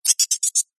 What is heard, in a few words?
science fiction robotic insect critter chirp; zapsplat